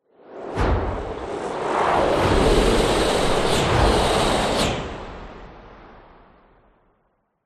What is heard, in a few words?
magic wind whooshes multiple; fantasy; zapsplat